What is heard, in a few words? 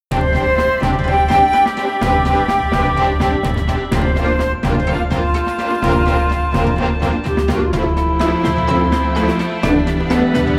Result BGM; Kirby Fighters 2